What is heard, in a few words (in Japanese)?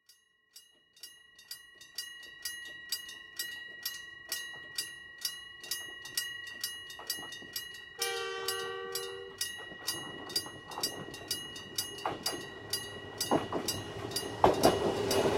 fumikiri